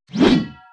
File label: sword, Weapon